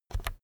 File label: keyboard external key press fast soft